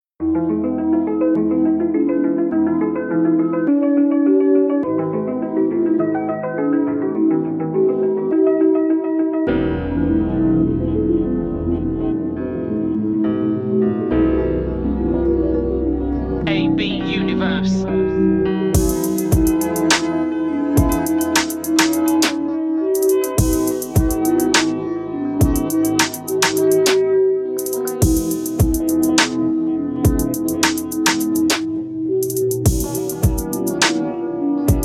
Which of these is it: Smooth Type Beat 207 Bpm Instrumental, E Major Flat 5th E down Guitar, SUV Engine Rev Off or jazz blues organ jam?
Smooth Type Beat 207 Bpm Instrumental